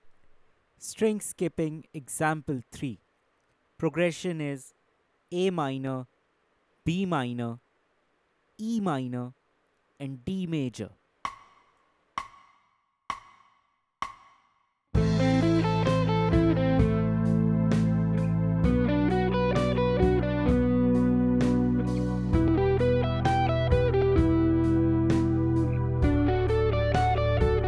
String Skipping Playthrough Slow Speed, lead guitar; string skipping arpeggios; The Art of Lead Guitar